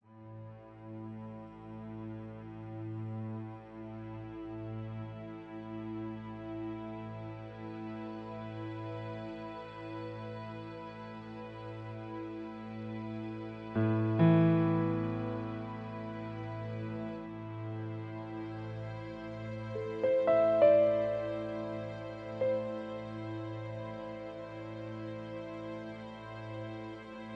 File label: ambiant; edge of eternity; Bahestras ambiant